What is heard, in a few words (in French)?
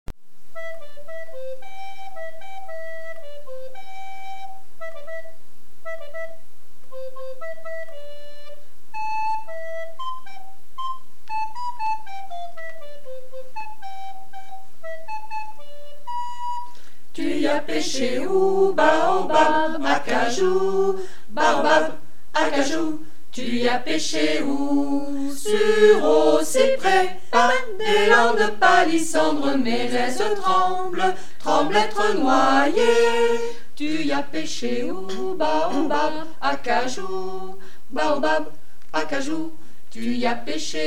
canon des arbres